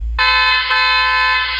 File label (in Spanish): Bocinazo; alarmas